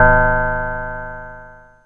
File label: ANALOG BELL; Zero-G; Ambient 1